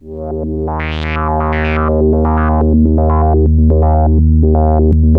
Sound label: ARP 2600, Vintage Keyboards; Big Fish Audio; First Call Vintage Keyboards